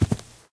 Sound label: grass, step; Minecraft; Votive World